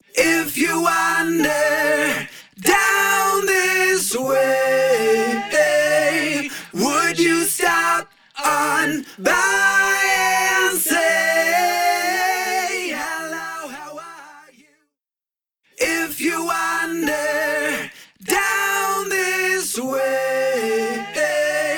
Vocal Bus H3000 MicroPitch Stereo; MicroPitch Immersive

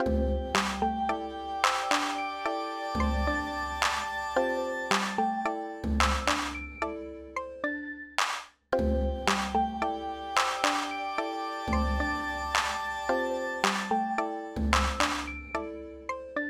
NoteFX off

